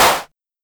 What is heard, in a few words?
Simmons Clap